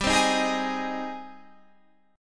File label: Ab Major, G, guitar chord; Ab/G strummed